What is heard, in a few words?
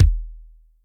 Pointy, Yamaha DTXpress Acoustic Kick